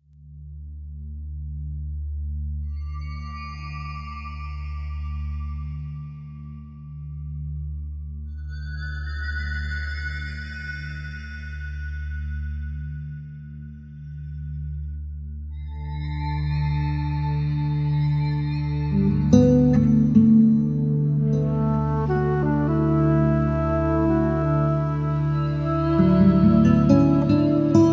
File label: Pink Skies Over Still Water gtr and Flute